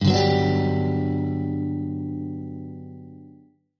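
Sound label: Gmaj7, Guitar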